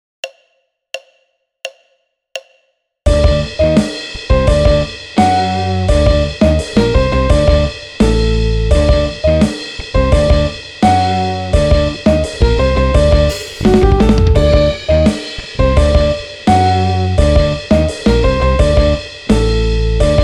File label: ALL RIFF